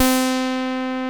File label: TEISCO; Masterbits; Vintage Voltage